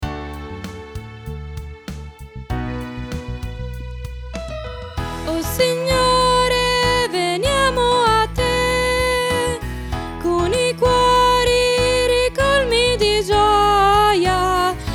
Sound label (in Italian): con gioia veniamo a te soprani